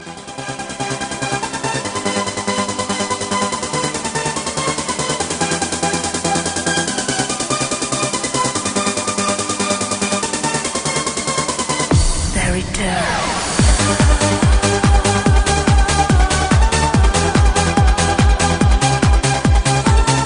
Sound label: techno trance